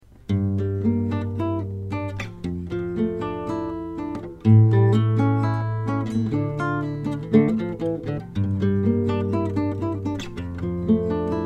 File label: two guitars